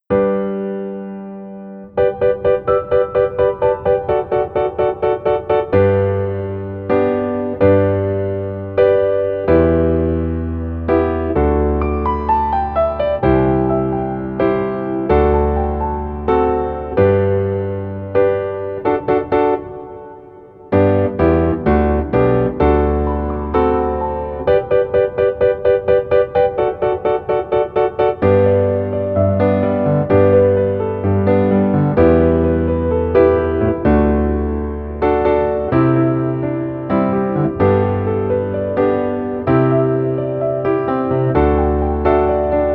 Piano ver 멜로디 MR -1 키

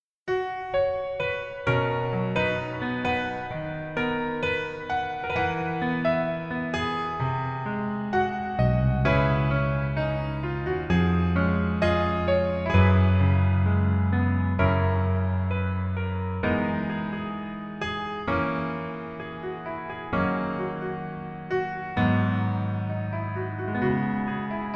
Piano ver 멜로디 MR -1 키

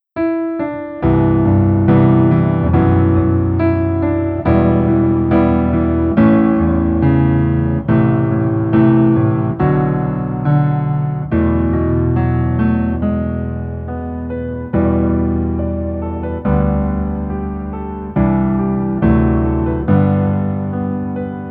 Piano ver 멜로디MR 남자키

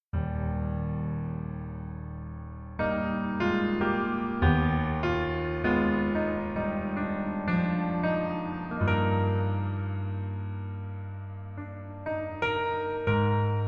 Piano ver, 멜로디MR

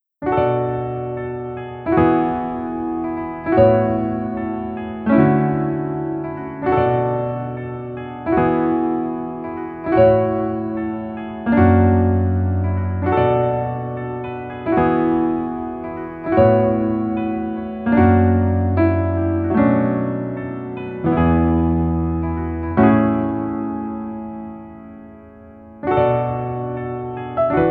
Piano ver, 멜로디MR, -1키

